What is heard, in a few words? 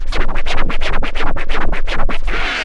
scra, Scratches; The Tables